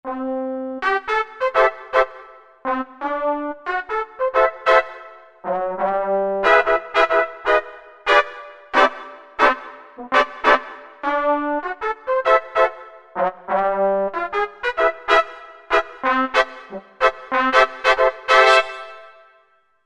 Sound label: Latin Trumpets; World; Roland SR-JV80-18 World Collection Latin